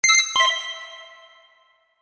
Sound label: Chime Musical, Chime Musical Multimedia